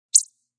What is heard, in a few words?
Cheep; Chirps